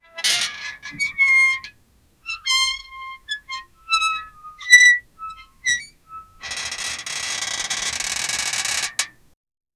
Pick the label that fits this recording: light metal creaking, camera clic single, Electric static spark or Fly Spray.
light metal creaking